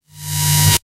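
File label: a subtle electrical swoosh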